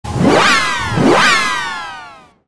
Zoom

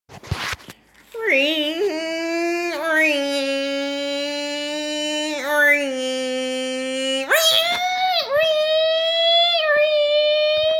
REEEEEEEEE VTEC

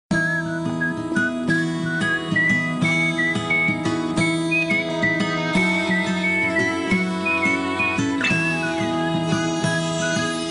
Heavens Tone

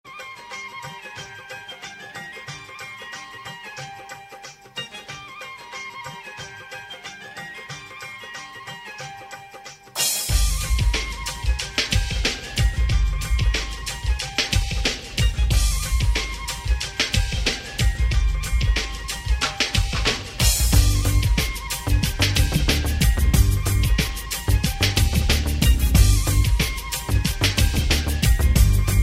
Rock

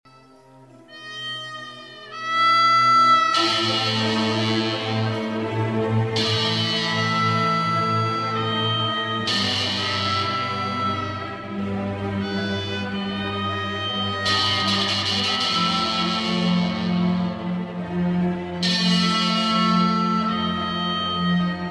Harmonica

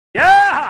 Yeeeha

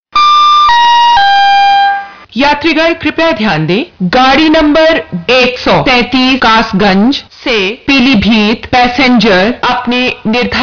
Train

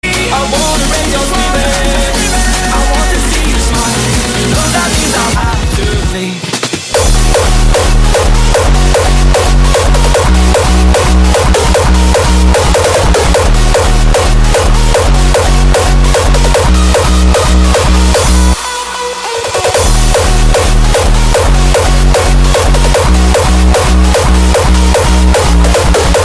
Hardstyle

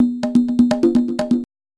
Conga Slap

